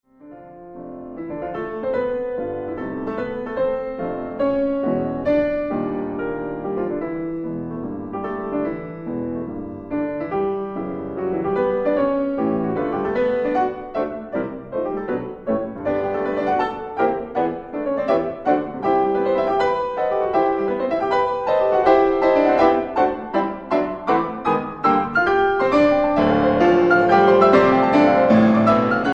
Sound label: Preludes for piano Allegro